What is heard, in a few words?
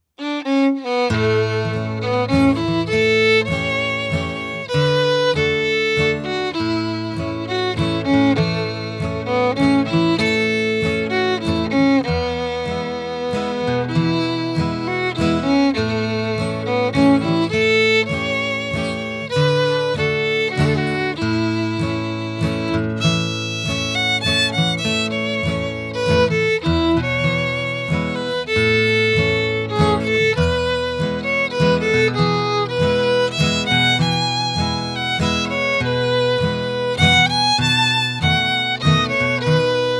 Waltz